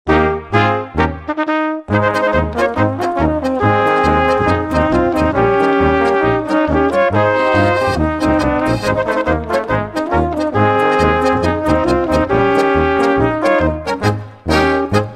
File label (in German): Polka